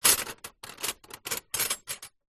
household stainless steel cutlery movement in drawer; zapsplat